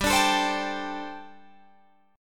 G Suspended 2nd Suspended 4th G, Guitar, Drop D

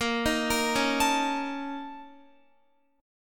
Bb Minor Major 7th Double Flat 5th, Guitar; BbmM7bb5 arpeggiated

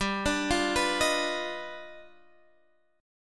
G 7th Flat 13th

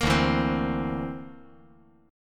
D 7th Suspended 2nd Sharp 5th D

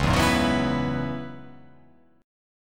C sharp Minor 11th C sharp

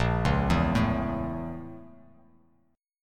Bb Minor Major 7th Flat 5th, Bass Standard chord; BbmM7b5; arpeggiated; 6,4,2,2; JGuitar

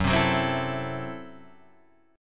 Gb Minor 7th Flat 5th, Guitar Half Step Down

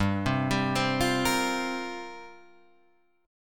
F Suspended 2nd Suspended 4th G

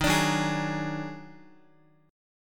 Eb Minor Major 11th, down, Guitar; EbmM11; strummed; JGuitar

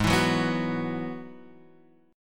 G sharp Minor 7th Sharp 5th G sharp; G#m7#5 strummed; x,x,x,1,4,1,1,3; JGuitar

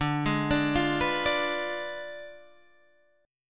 D Diminished 7th D down Guitar Half Step Down

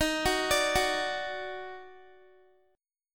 D sharp Minor Major 7th, Guitar; arpeggiated

